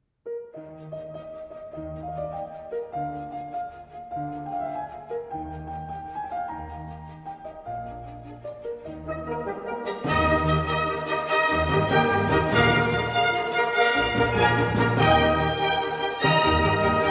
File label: Piano Concerto No. 22 in E-Flat Major, Allegro